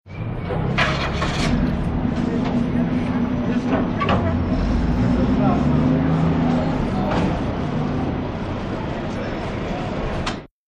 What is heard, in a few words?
Baggage Car Start De, airport